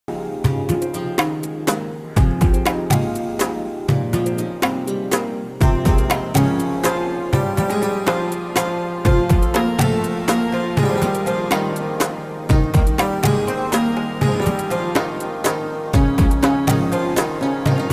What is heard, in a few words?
drama ringtone instrumental ringtone flute ringtone; mere humnasheen